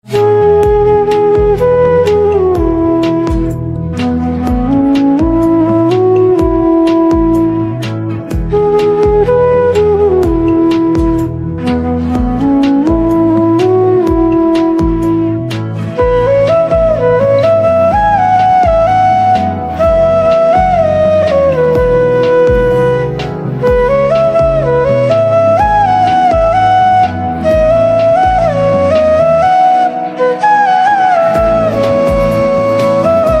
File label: Flute